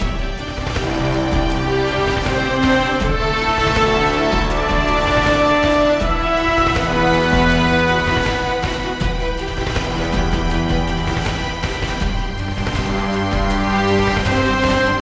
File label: Mood High